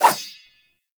Rapier Whoosh; Warframe; Weapons; Swords

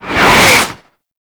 Tank Cannon Projectile Whiz; Warframe; Lasrian; LasrianTank; Weapons

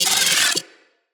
Arachnoid Micro Vocal Attack; Enemies; Corpus

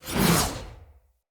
Knight Axe Whoosh; Weapons; Enemy; Axes; Snc Knight Axe

